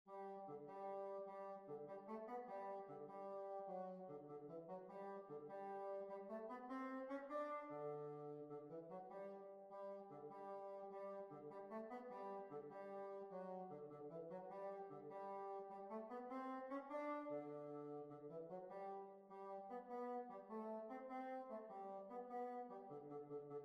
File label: Jig; Oscar Woods Jig